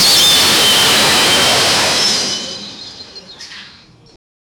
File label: loud crash silence after